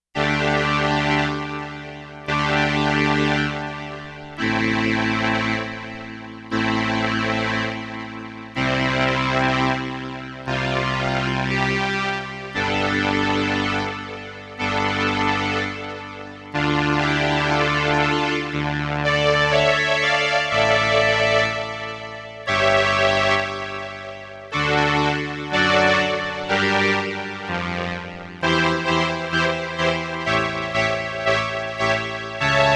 BRASS with vibrato, sustain, and Ensemble I, Roland RS-202